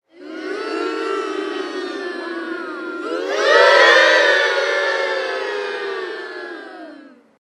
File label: Kids Booing Crowd